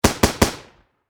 Warfare Machine Gun M240B Triple Shot Close Perspective